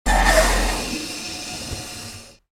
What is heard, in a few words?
hydraulic door